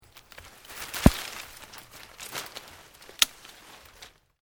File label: Vine Dead Move, Dragging; Material components for constructing more complex sounds; Dragging (Sound Effects:Objects)